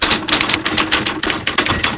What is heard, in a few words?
type; Digital Erotic 7